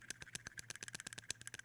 spider move, monsters

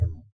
hurt, tengu

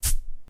flower miss, monsters

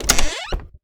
chest open

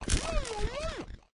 skeleton die, monsters

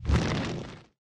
flame

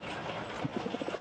floyd move, monsters

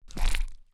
blub hurt

